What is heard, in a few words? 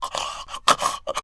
choke